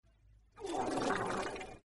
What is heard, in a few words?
fart meme sound effect